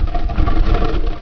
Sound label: push wood; Hexen2